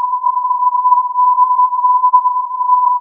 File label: filtered input